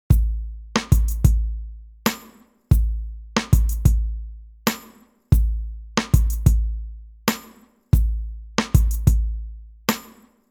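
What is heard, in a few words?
drum off; Maserati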